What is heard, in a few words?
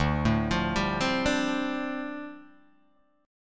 D Minor 11th D down Guitar Open D